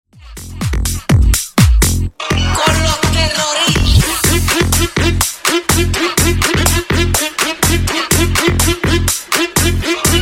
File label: Funk 124Bpm; Remix Edit